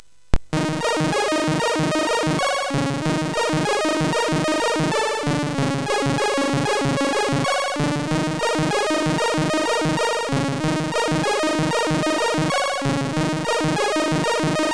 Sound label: Arrrrpeggioz sid MOS6581R4; hvsc